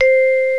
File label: Vibraphone (C4); X-Wing; X-Wing Patches